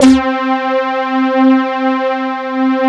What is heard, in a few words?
DS8 C 3, Korg